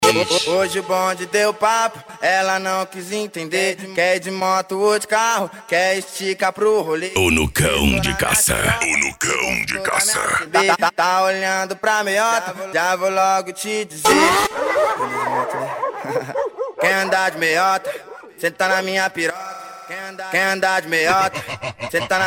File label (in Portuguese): funk ritmado